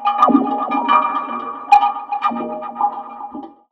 Synth Wah & Tape Delay, contact mic, Tin Of Guitar Strings; musicradar; contact mic madness